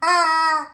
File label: rubber chicken; Items, Toys